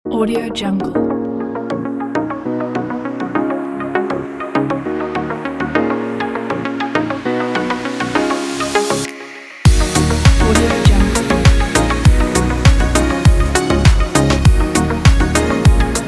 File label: pop positive background